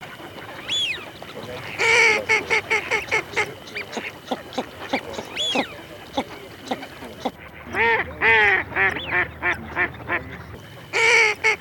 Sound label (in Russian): Широконоска Mestolone; plurifon